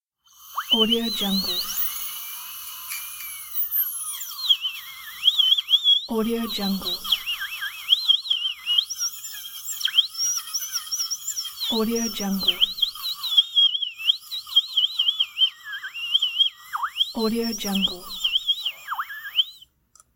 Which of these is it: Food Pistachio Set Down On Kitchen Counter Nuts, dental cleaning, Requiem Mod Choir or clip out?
dental cleaning